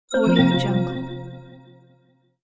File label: classic shine close ding